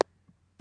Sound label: golf ball hit fairway; ProStroke Golf World Tour 2007